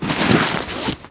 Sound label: bag open; firefight